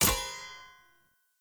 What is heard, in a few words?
Short Sword Medium Impact Metal